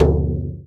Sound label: BASS, INDIAN PERC